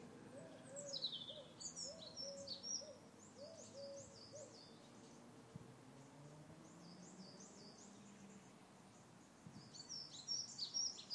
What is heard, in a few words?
charlton house gardens birds twittering and chirupping